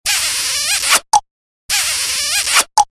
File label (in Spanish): Beso